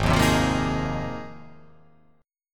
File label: G sharp 6th Add 9th G sharp